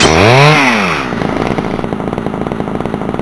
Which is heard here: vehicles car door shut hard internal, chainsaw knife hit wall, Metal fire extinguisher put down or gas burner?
chainsaw knife hit wall